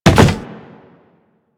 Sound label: Operator Land Soft Wood, Footsteps; Warframe